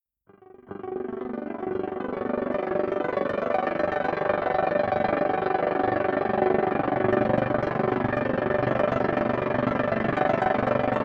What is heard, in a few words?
Vib Piano Bach Fast Engine